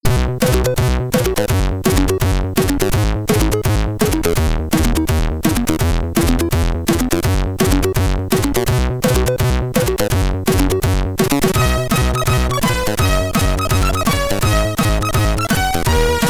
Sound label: Pachebel's Canon, Commodore 64; lemmings; Lemming 1